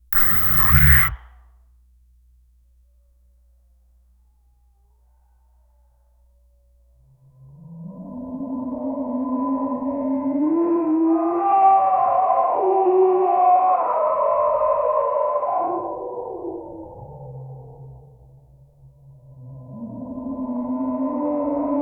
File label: distant tyrannosaurus rex, dinosaurs